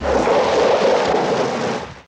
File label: Car Skid Stp On Dirt